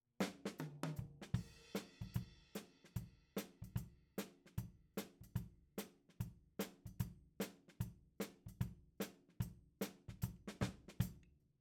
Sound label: Tom